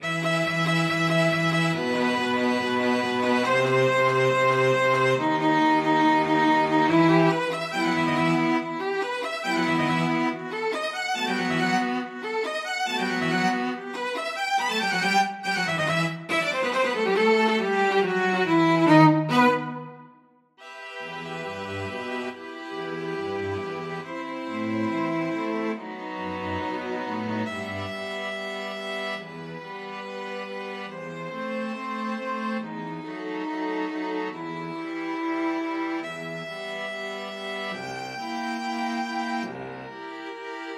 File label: string quartet